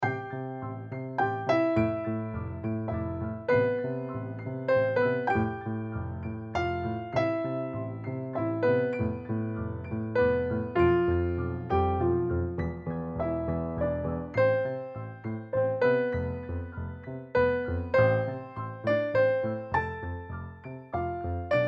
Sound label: piano